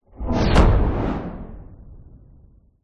sound design whoosh dark vroom impact hard

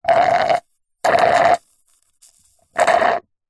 food drink milkshake thick suck dregs straw slurp; zapsplat

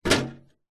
household mailbox metal close; zapsplat

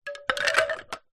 household wind chimes wooden set down on ground

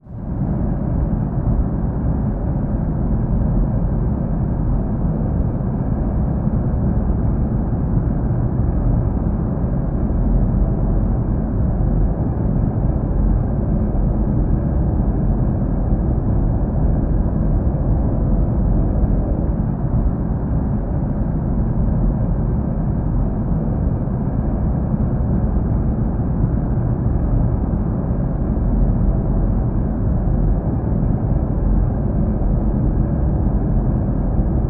science fiction spaceship station drone loud

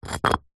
foley brick set down ground; zapsplat

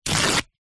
paper towel kitchen roll rip; zapsplat; foley

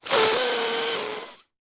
human man sniff long

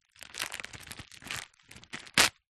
foley packet zip ties open; zapsplat

